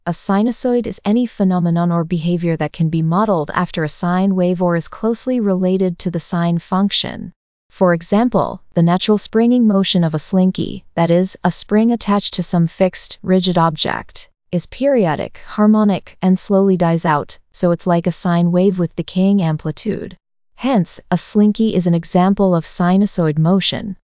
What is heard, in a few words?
sinusoid